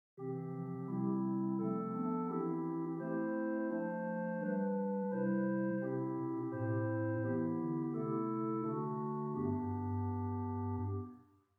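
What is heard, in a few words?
Swell Lieblich Bourdon 16; Hauptwerk; St Annes Moseley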